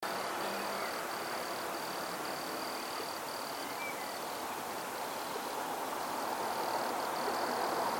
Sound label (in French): criquet